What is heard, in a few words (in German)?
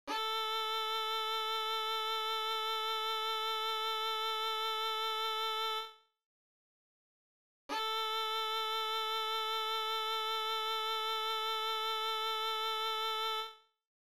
Stimmton A; violaschule